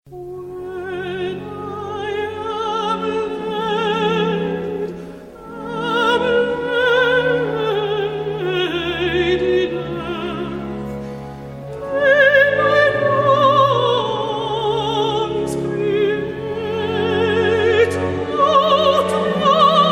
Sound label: aria; Opera; Baroque; Baroque period